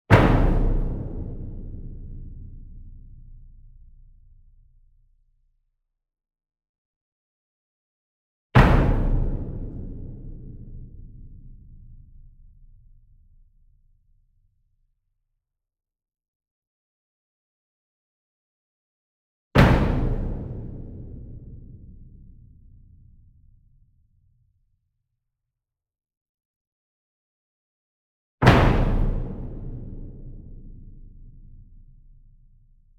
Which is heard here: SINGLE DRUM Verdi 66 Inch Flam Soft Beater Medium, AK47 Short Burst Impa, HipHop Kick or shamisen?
SINGLE DRUM Verdi 66 Inch Flam Soft Beater Medium